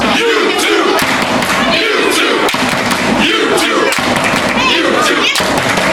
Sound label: hacken chant